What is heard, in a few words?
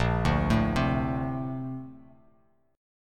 A sharp Major 9th, Bass Standard; arpeggiated